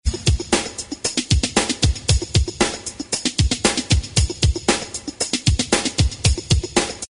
rhythm beat break drum

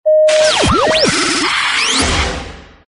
comm static closeout

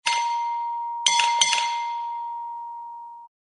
bells bell gas station

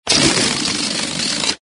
balloon exhaust

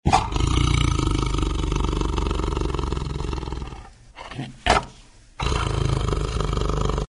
animals tiger growls

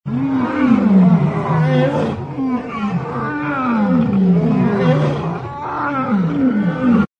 african wildlife symphony

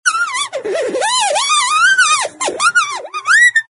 hyper horn

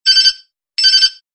beep low pitched warble signal

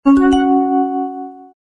xylophone short arpeggio up

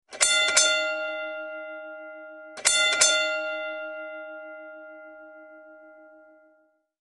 Ring The Alarm Bell

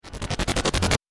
chopper blades

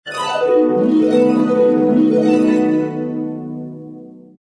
harp multiple glisses

